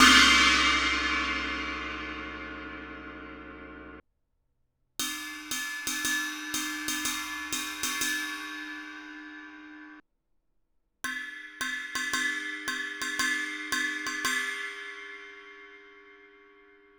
zildjian 18 inch a custom china crash crash ride bell